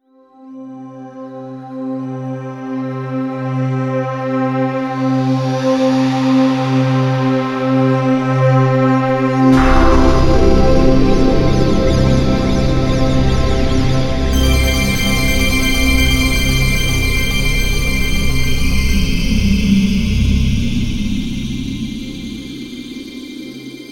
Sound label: Whales Symphony